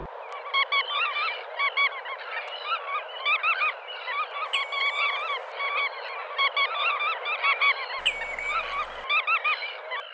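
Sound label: bar tailed godwit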